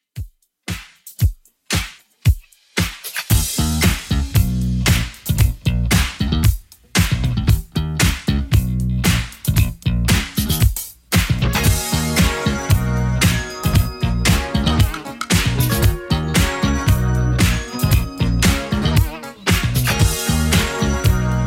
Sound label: 80s Redrum 115bpm CLEAN